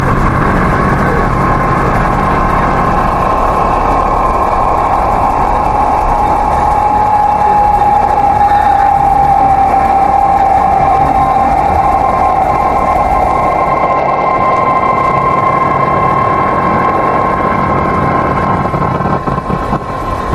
error glitched sound

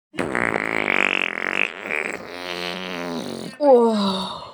fart x2

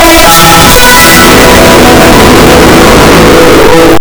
mega loud sound effect

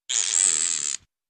plastic stretches

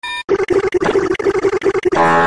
ugh guh

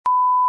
bleep

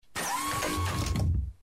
deploy canon; dnd

